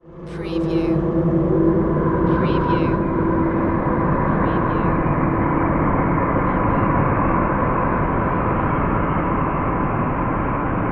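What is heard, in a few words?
Production Element Swell; Sound Effects Bible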